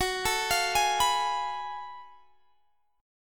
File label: Gb Minor Major 11th Gb down Guitar Drop D